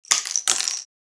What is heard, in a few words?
Darts dropping on floor; sport